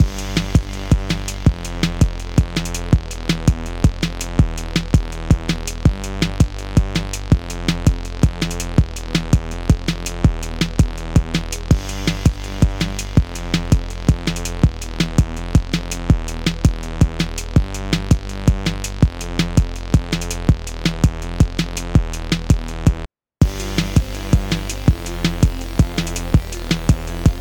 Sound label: 164bpm Video Game Music